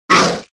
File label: werewolf wound